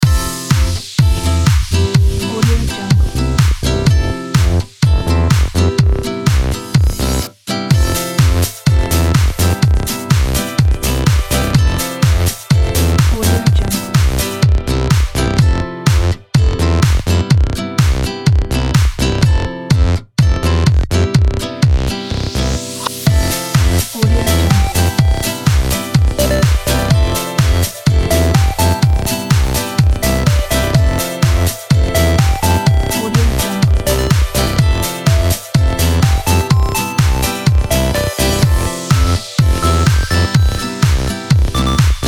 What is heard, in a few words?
happy dancing